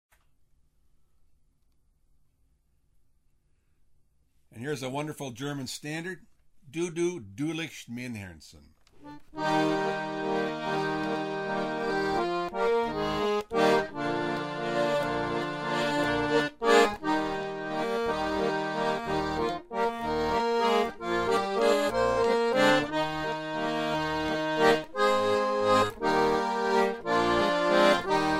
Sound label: Du Du Waltz, concertina